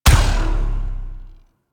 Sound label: Rail Jack Weapon Two Shot; Modular Weapons